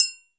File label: chanchiki, Chappa and Chanchiki Cymbals